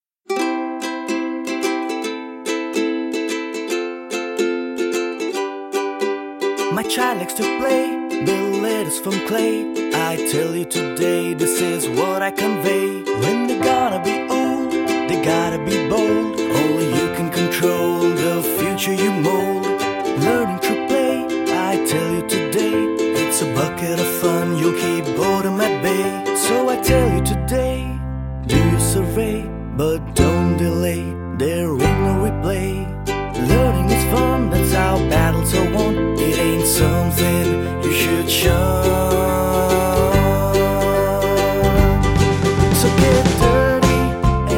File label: jingle